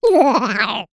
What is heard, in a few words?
cartoon character laugh; zapsplat